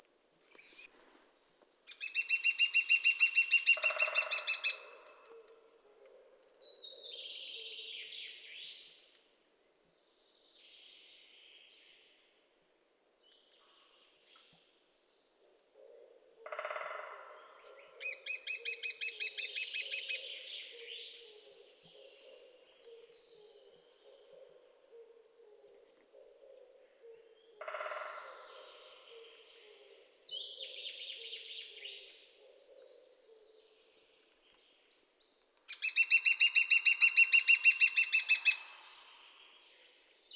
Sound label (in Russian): dyatel malyy pestryy golos